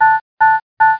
blink; How Many Bugs in a Box

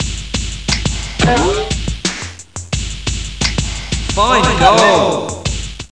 GOAL; CD Action

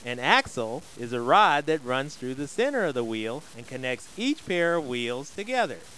WHEEL

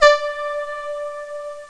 VIOLA

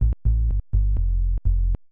SNTH BASS HOUSE 125 A; eJay

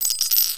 Get Coin; Revista CD Expert 50; PCGamer50; KNOCKIN

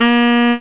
SAX; Atari Mega Archive; synths

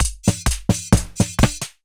machine mechanic 130; maudio; Elektron

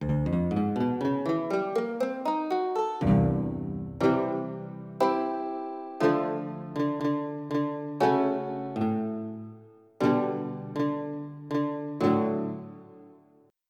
Dry Mandolin

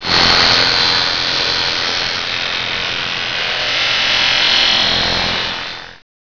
sander

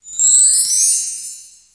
Sound magic

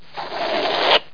ballon zip; DR BRAIN; BUGS

